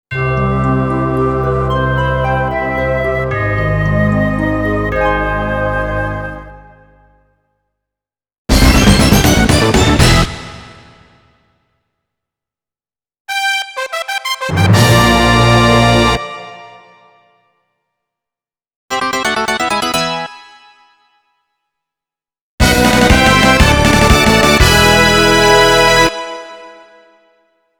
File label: Jingle Medley; tales of destiny 2 original soundtrack; Recess~Get Item~Get Title~Get Recipe~Congratulations!